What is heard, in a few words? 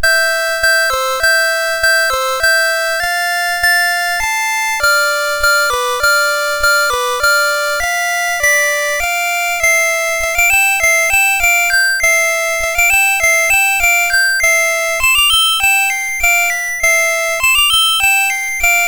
Sheep may Safely Graze, MOS6581